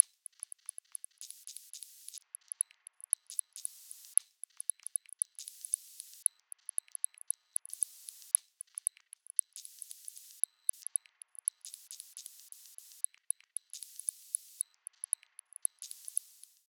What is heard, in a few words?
115 Drums